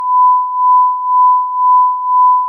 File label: 1kHz mod